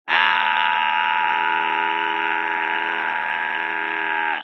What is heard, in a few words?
aaah